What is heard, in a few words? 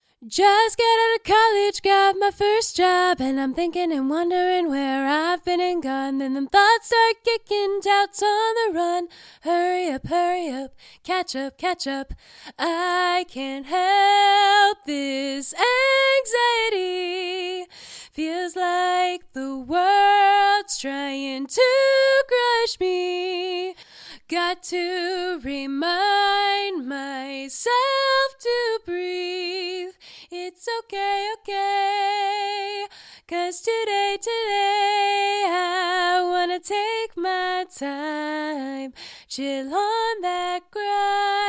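Pop, 80, F major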